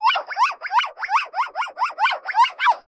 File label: animal